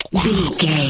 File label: zombie voice eating chewing